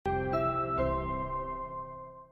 chimes short

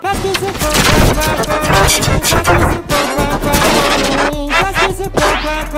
boing song; klasky

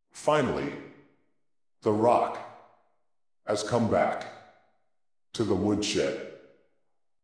rock

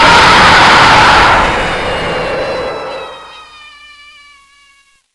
jumpscare; fnaf dungeons 2

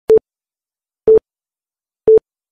321 animation

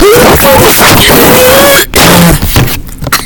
cough

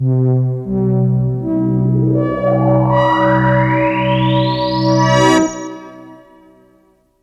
moog; statement

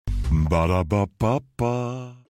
jazz

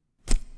stab

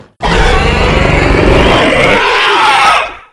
indoraptor

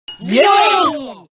cheering; alliance

